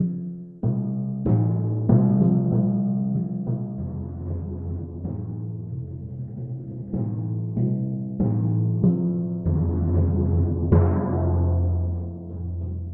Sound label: Timpani